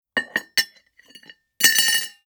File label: Dishes Dropped on Counter; Fairlight Sound Lib